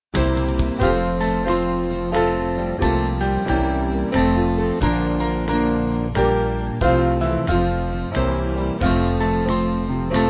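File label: Band, Music Backing Files for Churches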